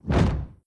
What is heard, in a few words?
step; sven; bull